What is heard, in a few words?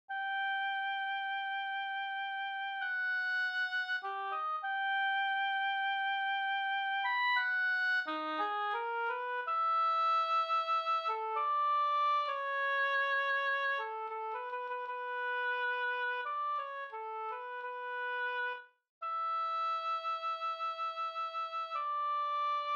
fl ob midi opening